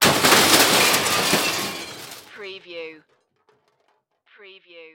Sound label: Crash Metal